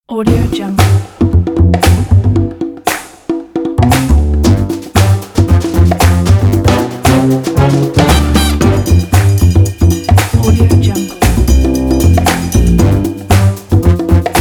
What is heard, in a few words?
upright funk bass